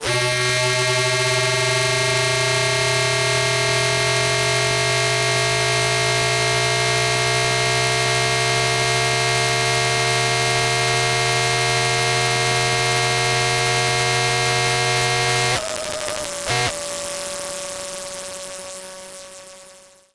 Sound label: cnc wood carving machine; sonniss gdc